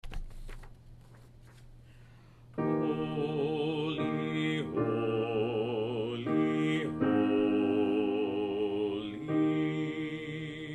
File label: Holy Holy Holy Bass; Holy, Holy, Holy, F. Schubert; Choral Resources